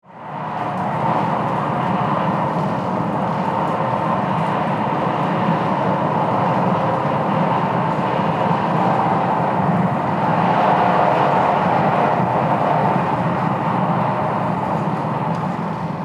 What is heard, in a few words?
on the high speed train